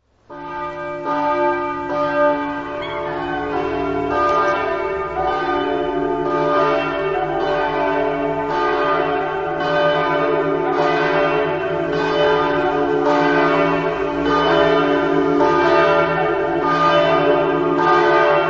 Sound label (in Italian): forni, campane